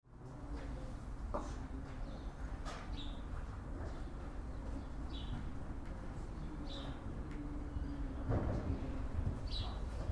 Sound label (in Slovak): zeleznicna stanica nastupiste, martin